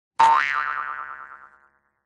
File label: cartoon spring sound effect